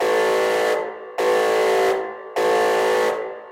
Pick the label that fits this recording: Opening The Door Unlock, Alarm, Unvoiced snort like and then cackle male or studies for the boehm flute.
Alarm